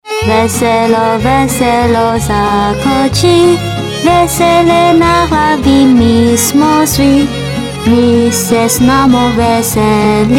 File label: JINGLE